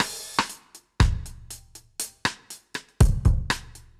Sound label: Dub Drums A Dry 120bpm; musicradar